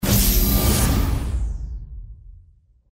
dash change

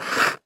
blade

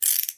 cuff; police

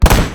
nagant; weapons

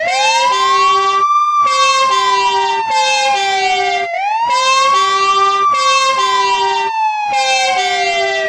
fire, siren

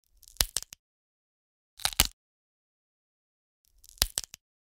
bone; damage

